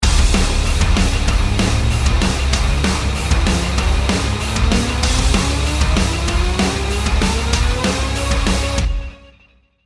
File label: round ten sec count, music